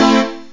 chord; OctaMED SoundStudio V1; jazzies samples

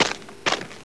step; dorf

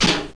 pop; Dorling Kindersley My First Incredible Amazing Dictionary

